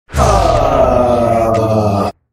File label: death slam miss; lash